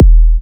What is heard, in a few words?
Minimal Kick, Drums, Kicks